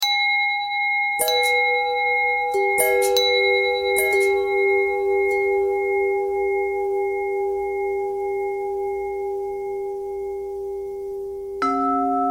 long bell bell thread simple, bells

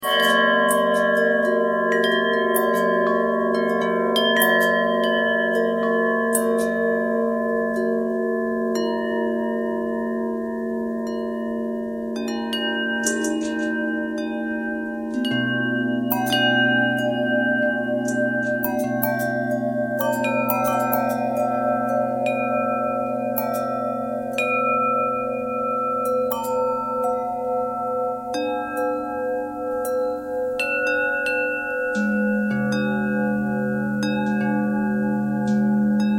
bells bendtwist

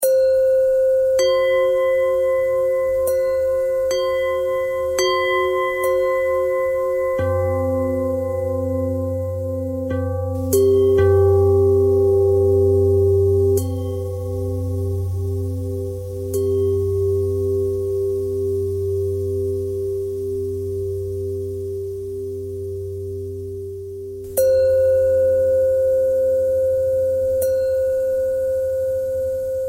bell threads

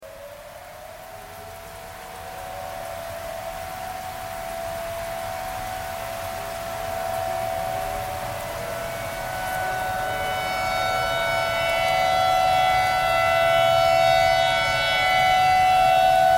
tornado siren

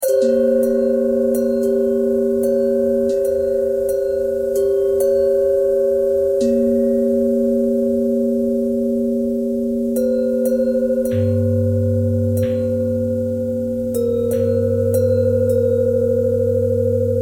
bell thread

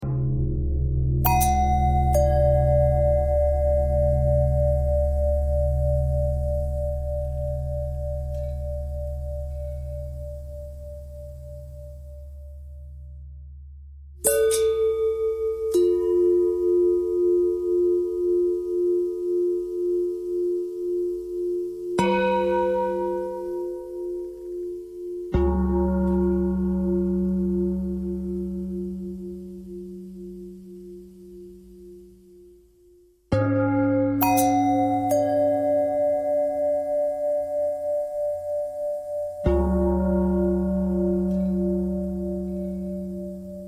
long bell bell thread pentatonic, bells

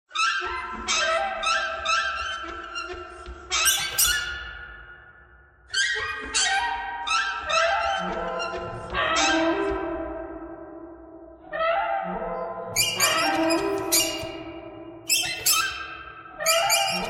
birds thread buzz

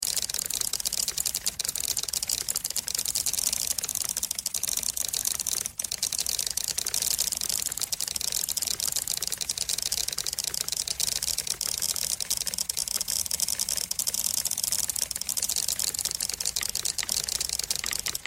typewriter thread harmonic all

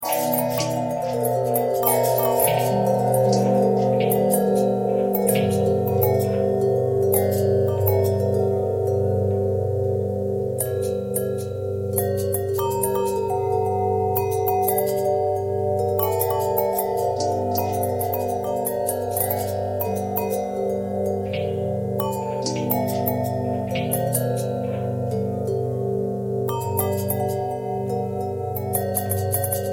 long bell long bell twist, bells

